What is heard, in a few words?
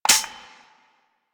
AK74 Dry Fire; Warframe; Kalashnikov; Reload